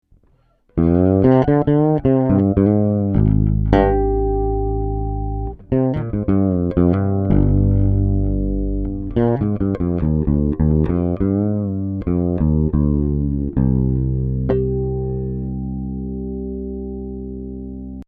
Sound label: jazz fretless